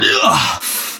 pain; genji

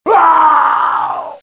death; hl2dm

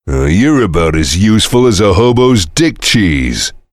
heavy laughter big; tf; duke

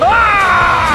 "uhhaa"; superheros; greenarrow; backstab

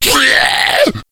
pain, slender shiver

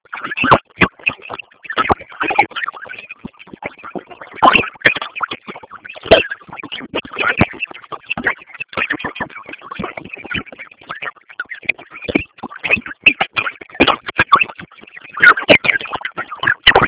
hit; freak fortress 2; mac

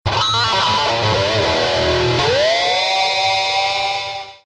guitar rif

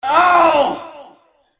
scream

